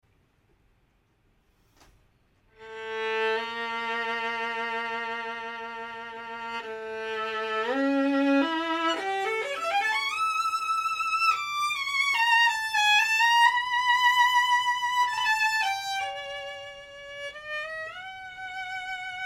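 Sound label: Elite, Professional Violin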